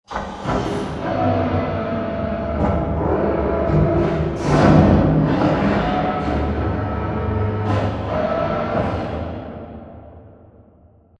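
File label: Assembly Wall Arm Distant; Boomtown; Titanfall 2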